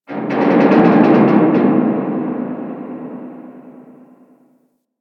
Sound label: Infested Distant Metal Creak, Ambience; Warframe; Corpus Infested